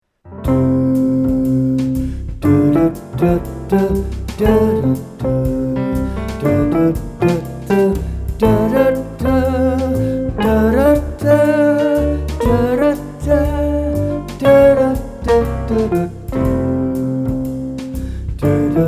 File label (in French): Repos swing